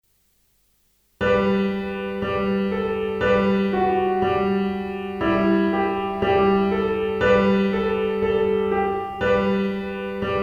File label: piano pop